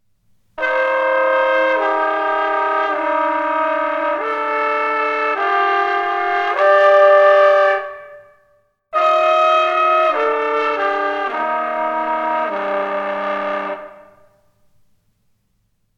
Dorico Protus Duetos Trp

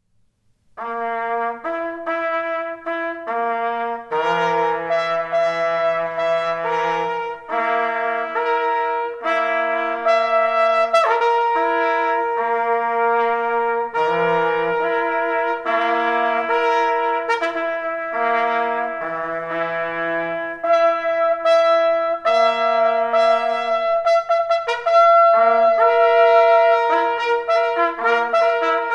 Duetos Trp; Bifonica

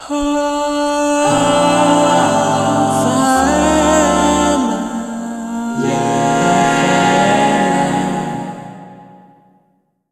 Vinheta Canta

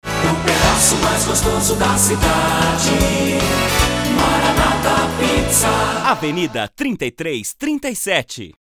vinhetas; Maranata

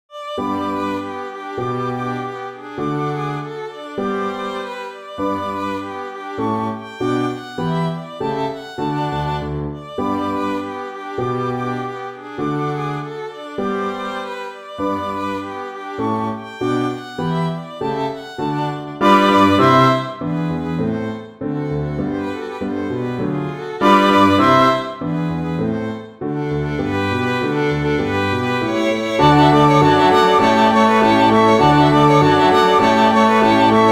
Duetos para Violino; Numero 12 dos Doze Duetos para Violino K487

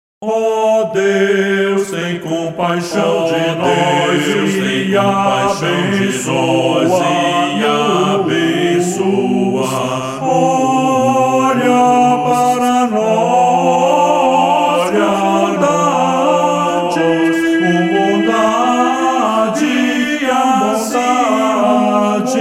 Moteto a Duas vozes; Salmo 67

